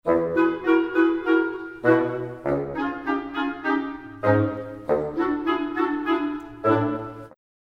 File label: light hearted motif